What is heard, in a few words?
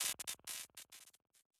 Boss Core Hurt Stinger; Avie